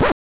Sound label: Scratch; Mick & Mack; M8; Genesis